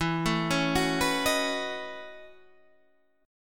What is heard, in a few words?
Gb Minor 13th E